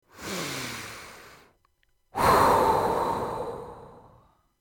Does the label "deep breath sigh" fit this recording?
Yes